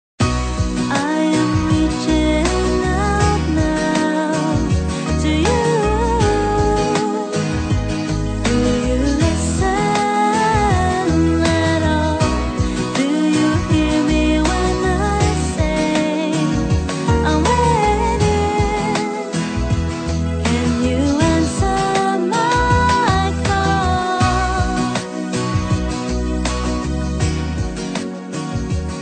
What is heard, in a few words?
nokia 1112